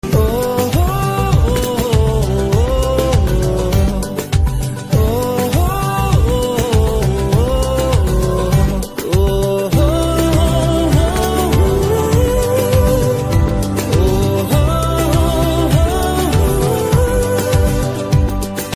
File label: BGM Humming Tone; dil bechara